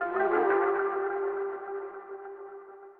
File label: ethno, 160, C